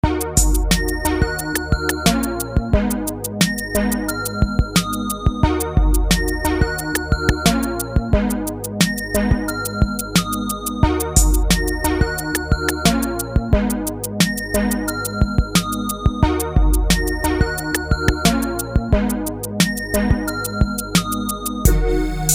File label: Poly-800